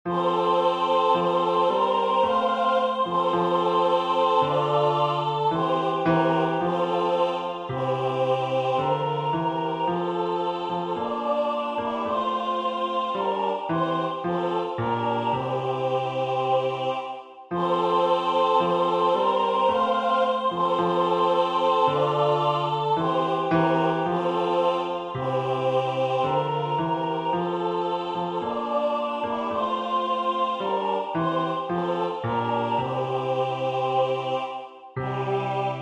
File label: Tollite Hostias Bass